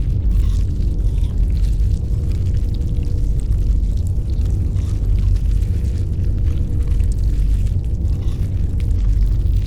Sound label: ant ship colossus idle; biogenesis